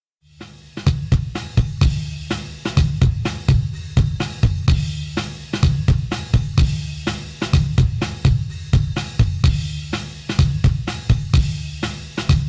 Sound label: drums